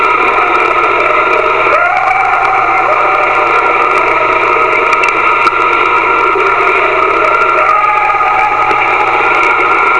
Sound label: screams